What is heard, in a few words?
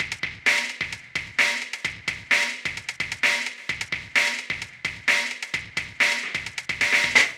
Breakbeat 130bpm, MIDI Drum; Ableton; Factory Packs